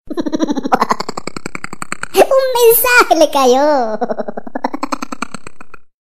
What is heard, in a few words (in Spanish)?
Mensaje